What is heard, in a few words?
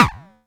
percussion, drum machines, FutureRetro FR777